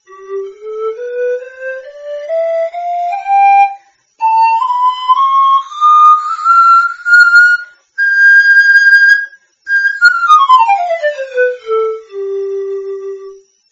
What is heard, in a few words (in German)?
pan gross